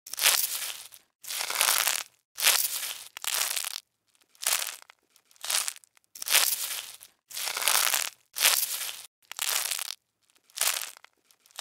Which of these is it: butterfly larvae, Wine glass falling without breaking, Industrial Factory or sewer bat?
butterfly larvae